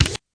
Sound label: button crunch